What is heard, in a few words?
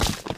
object hit concrete; lightning speed